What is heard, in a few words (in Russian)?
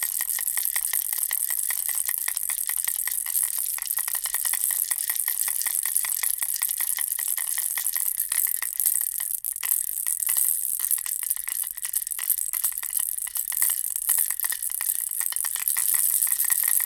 zvuk pogremuski